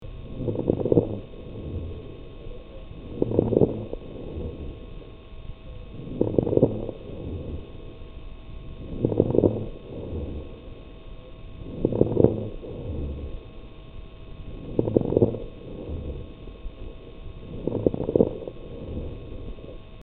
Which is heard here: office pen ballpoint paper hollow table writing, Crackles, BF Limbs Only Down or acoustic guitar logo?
Crackles